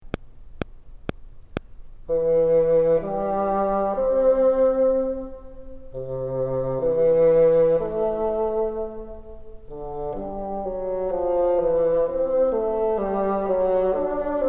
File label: top, bassoon